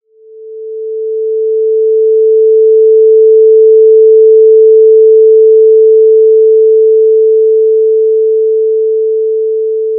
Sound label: ring 440